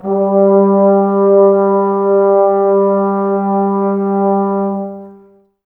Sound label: BRS TBS G, Woodwind Brass Ensemble, Brass; Miroslav Vitous - Woodwind Brass Ensemble